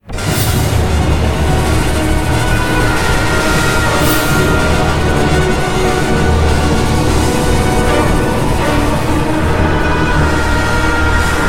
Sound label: atmosphere